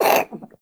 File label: Kitten Cough Hairball; Enemies; Warframe; Catbrow